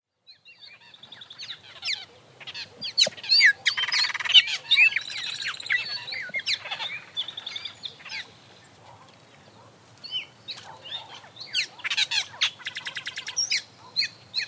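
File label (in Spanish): Tordo Patagónico, Curaeus curaeus, Austral Blackbird; cantos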